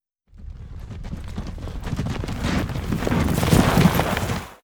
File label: stag arrive